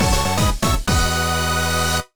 Jingle Skillup; Monster Racers; ds

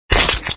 death

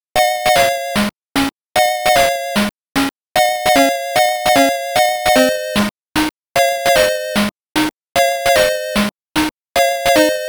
Round Clear

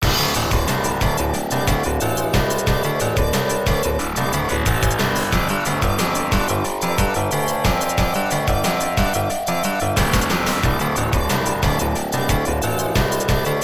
Stage Boss; Gigandes; Taito X System; arcade

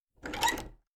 Door Handle

